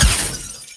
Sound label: shield break sound; fortnite